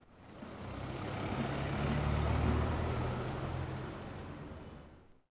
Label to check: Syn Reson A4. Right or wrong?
Wrong